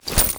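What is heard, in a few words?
bash, super shotgun; weapons; doom; ssg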